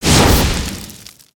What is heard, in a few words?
Attack Fireball; Dragon In A Box; destructibles